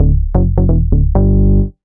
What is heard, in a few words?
BAS, 2 Step